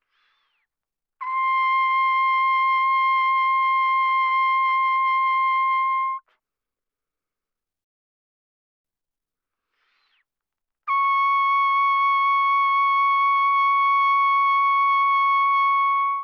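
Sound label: Trumpet vibrato pp C6 D6; Brass; Bbtrumpet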